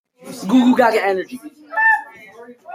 googoo gaga

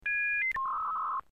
internet sound

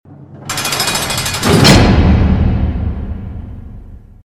wolf dungeon gate

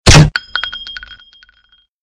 pistol with silenced drop shell

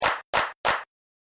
claps

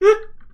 singular honk

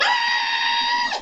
squeal

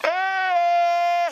ahhhhhhhh

